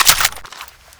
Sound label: mag out, walther2000; weapons, gamefreak